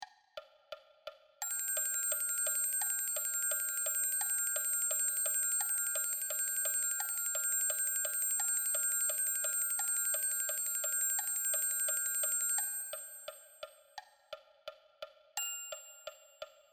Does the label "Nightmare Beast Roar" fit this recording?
No